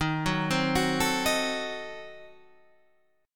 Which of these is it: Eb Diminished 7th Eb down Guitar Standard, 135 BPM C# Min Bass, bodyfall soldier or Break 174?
Eb Diminished 7th Eb down Guitar Standard